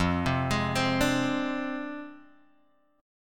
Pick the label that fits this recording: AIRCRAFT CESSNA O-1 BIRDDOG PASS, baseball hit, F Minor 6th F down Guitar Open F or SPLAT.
F Minor 6th F down Guitar Open F